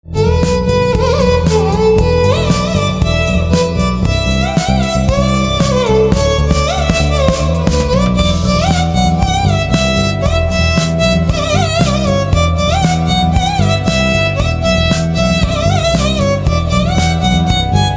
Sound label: Violin